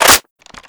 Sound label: ak47 reload clipin